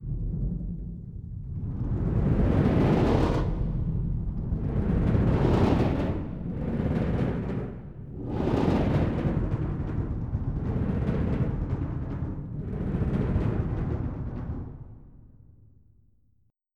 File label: BD crescendo